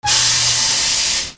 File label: INDUSTRY